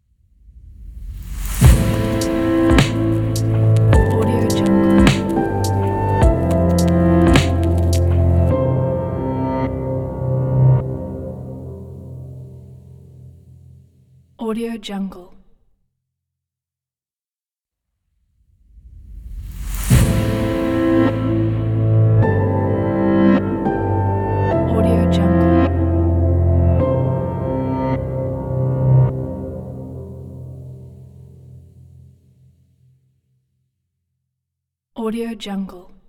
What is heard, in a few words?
ambient glitch logo